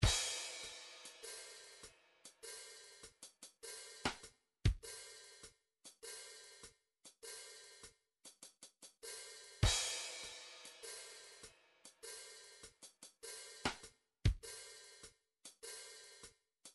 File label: midi drums swing